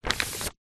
foley paper sheet a4 run through hand; zapsplat

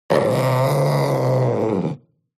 PANTHER Young Snarl; ANIMAL; smartsound

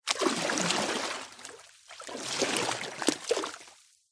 nature water deep surface movement slosh

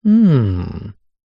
human male hmm stumped; zapsplat

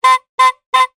cartoon hooter honk x3; zapsplat

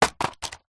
medicine lozenge pack drop; household; strepsils

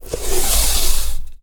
medieval scythian recurve arrow draw; still north media

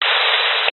technology cb radio static burst

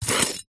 knife scrape dirt

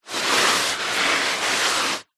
human body clothed move shuffle carpet

